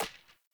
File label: Hunter Bow Grab; Weapons; Player; Bows; Envoy Hunter Bow Ranged Melee